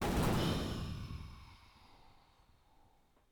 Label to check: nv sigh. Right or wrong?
Wrong